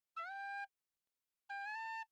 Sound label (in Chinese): 侗笛 演奏技法 滑音